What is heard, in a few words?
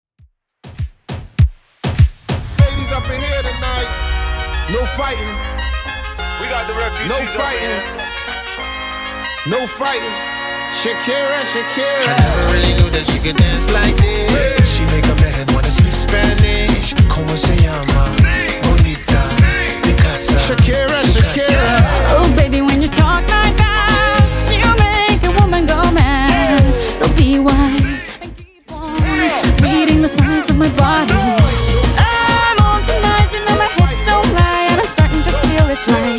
2ks Redrum 100bpm